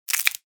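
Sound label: branch broke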